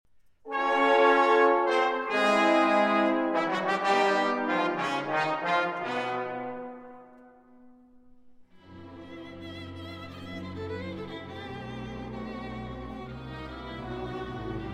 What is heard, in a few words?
Saxophone Concerto in C Minor Finale